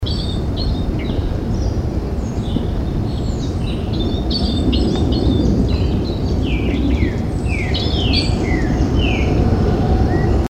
Turdus subalaris; PE Cantareira; SP

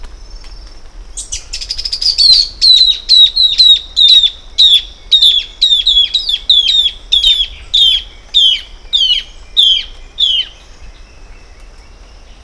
Phacellodomus erythrophthalmus; Estr Casa da Farinha; Ubatuba; SP; Brazil

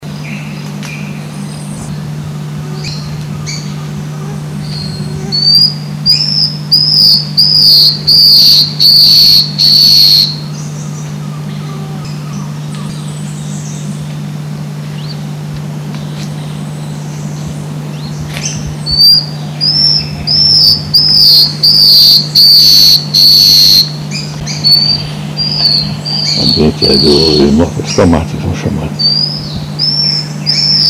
Drymophila squamata; EE Jureia; SP

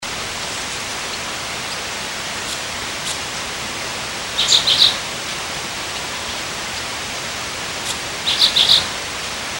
Euphonia pectoralis; PN Itat; A Silveira

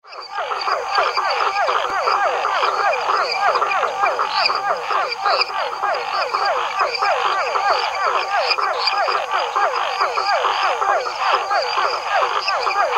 Physalaemus cuvieri; Arac da Serra; SP Silveira

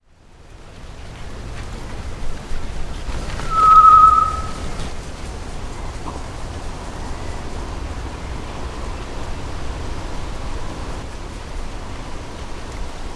Tinamus solitarius; PE Cantareira; SP; Silveira

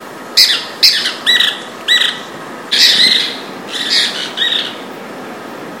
Hirundinea ferruginea; PN Itatiaia

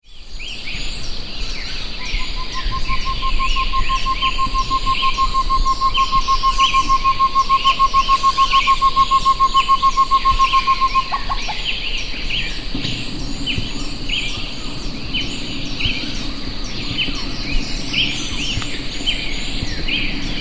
Chamaeza campanisona; Intervales Park; Brazil; Silveira

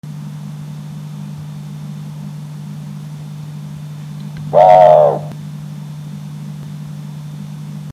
Strix hylophila; Itatiaia NP; Brazil; Silveira

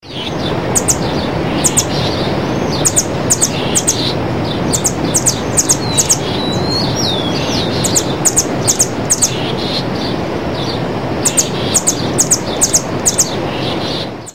Amazilia fimbriata; Jureia; SS; SP; Brazil; A Silveira

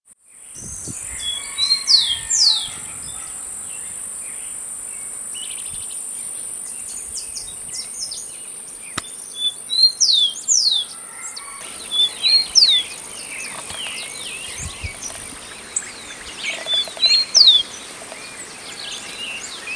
Zonotrichia capensis; Vl Quilombo GR RS; Silveira

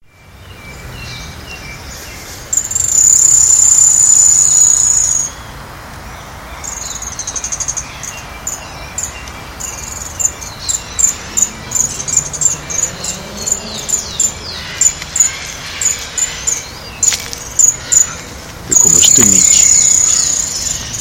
Picumnus temminckii; Man Lopes; Pq Ibirapuera; SP; A Silveira

